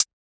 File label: Hihat